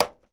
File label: Plane P40 Click Hatch Panel; Airplanes